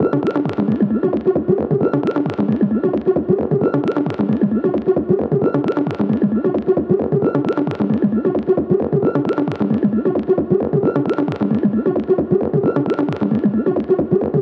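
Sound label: Synth 133bpm G minor Melodic Dark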